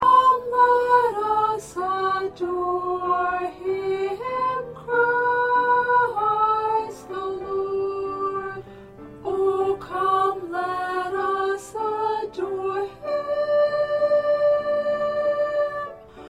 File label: 2nd Soprano